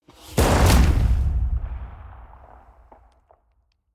soft gunshot whoosh; schusswaffen

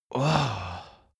male relief sigh; koerpergeraeusche; menschen; soundpool

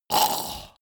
monster choking zombie short; koerpergeraeusche; comedy-cartoon; soundpool

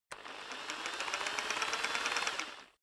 rock drill hammer speed up short soft; maschinen; bohrer; soundpool

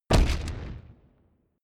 wood stack bass; whooshes rises; hits impacts

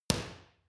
boxing paw hit hard

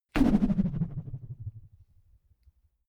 whoosh rubber band vibrate soft; swooshes-swishes

